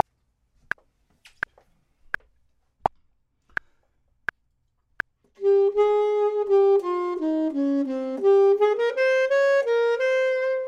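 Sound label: bottom, Saxophone and Clarinet; voxman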